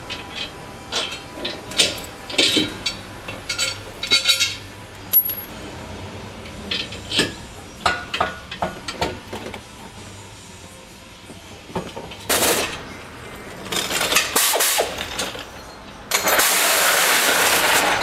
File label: borla hush, muffler; iroc